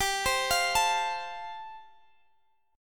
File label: A-Minor 7th Sharp 5th G